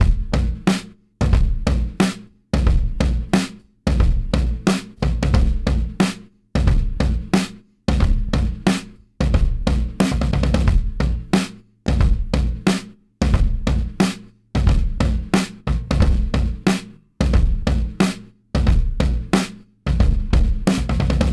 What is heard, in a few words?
90 drum loop triplets toms blown